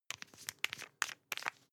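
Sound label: toothpaste open rattle, Bathroom; haus-garten; badezimmer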